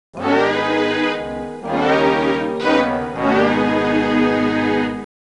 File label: Game Over; New Toonland; Gex